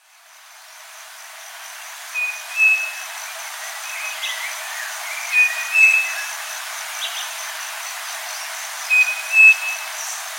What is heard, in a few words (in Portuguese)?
Canto do saci